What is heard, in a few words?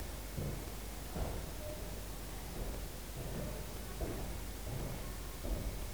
song